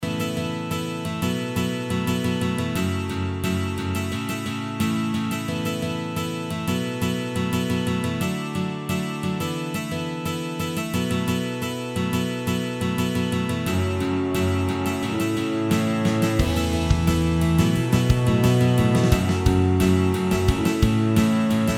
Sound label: Karaoke Version